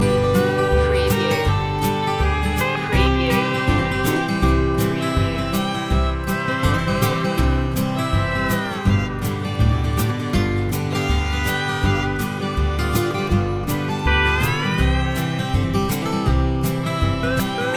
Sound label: country loop